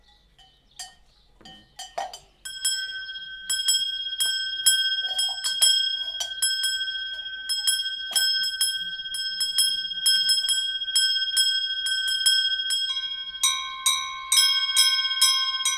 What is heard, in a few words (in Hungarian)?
boszormenyi harang, edelenyi csengo; laszi tanya